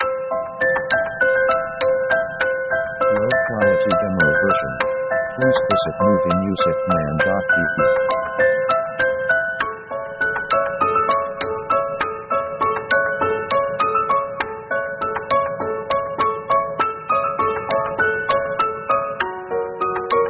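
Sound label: orchestra modulation minor down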